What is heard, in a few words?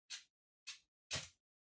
Lighter (Far-Flick)